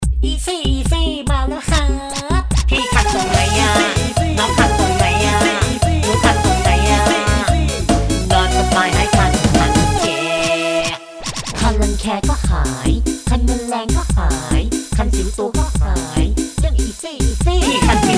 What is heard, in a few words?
Soft Sell Song; Eazi; Pcosmed